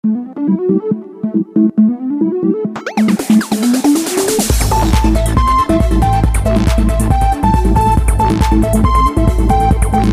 trance funk

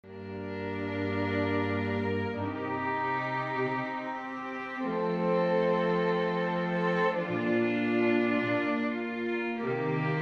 barock improvisation

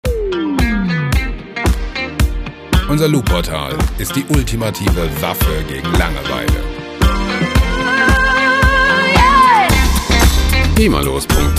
disco strings

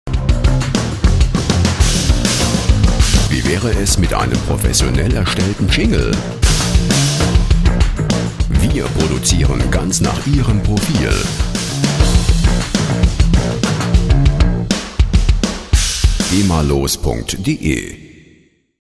rock drum

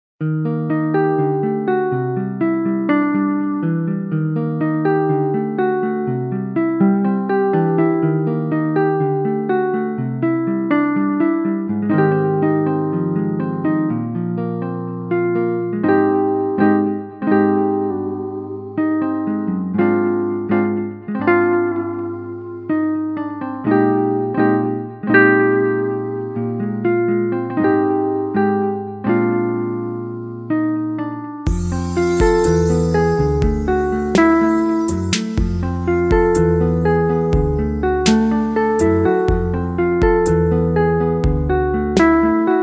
jazzy guitar

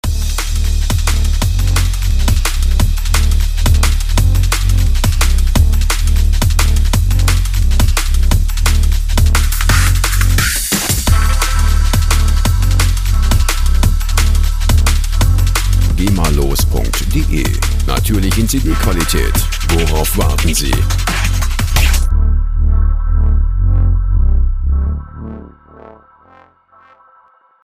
dnb

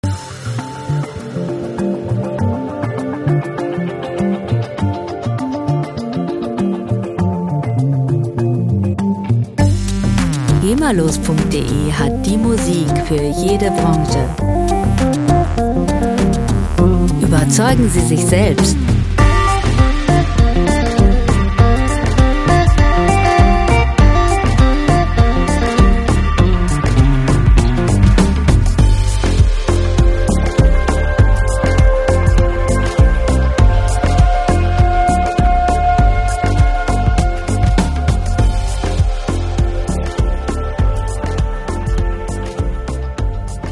lingala chill guitar

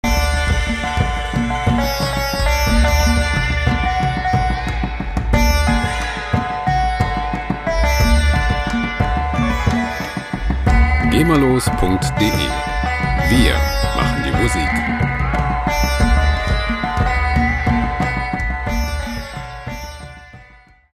sitar

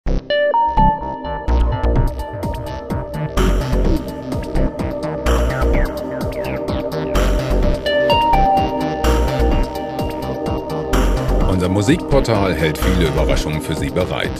stepsequencer

